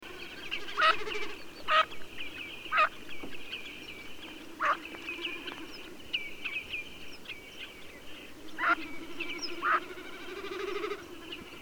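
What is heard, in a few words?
eurasian teal, birds in flight, myvatn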